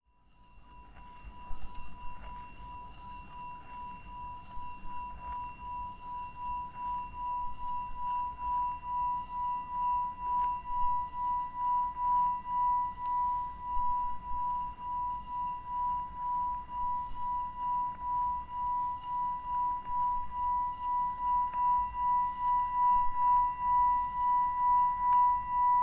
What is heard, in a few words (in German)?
Klangschale reiben